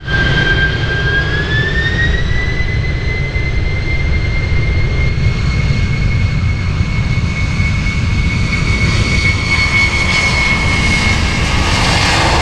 passenger plane taking off from runway

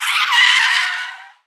car braking suddenly

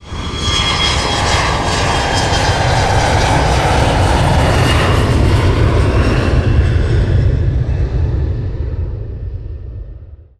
passenger plane passing by

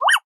otter cry

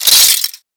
wielding a katana

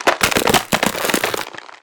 creaking muscles

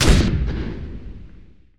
battery

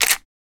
taking picture with camera

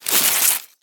ripping paper

